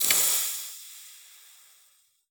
blacksmith, forge, hotiron, water, squelch, sizzle, rough, popping; Ilmarinen